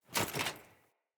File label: Foley Action Armour Dodge; Player; Deora Armour